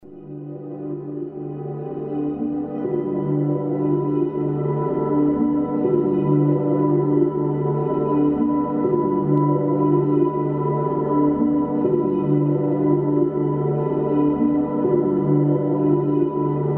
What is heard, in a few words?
Piano